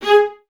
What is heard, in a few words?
String Ensembles, Violas; G#4; Miroslav Vitous; LINKS ORCH